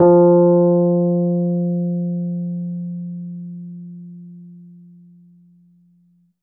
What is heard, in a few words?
F, FEND KEYBASS; Sonic Reality; Vintage Timetraveler